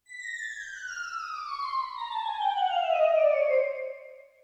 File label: strings in chorus